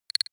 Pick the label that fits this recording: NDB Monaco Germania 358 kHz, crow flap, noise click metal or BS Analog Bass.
noise click metal